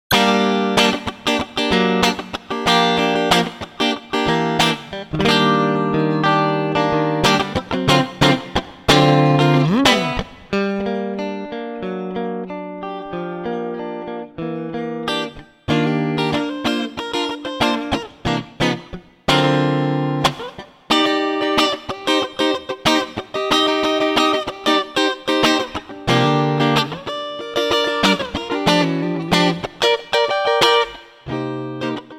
Improvised Rhythm Guitar Playing Example; electric guitar; electric guitar performance diploma

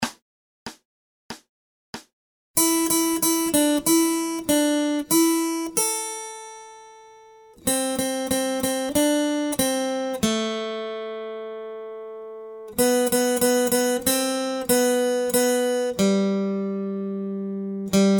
Acoustic Accompaniment, acoustic guitar

